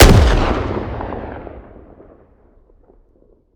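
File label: weapon pistol mag fire player atmo ext; gmod; viper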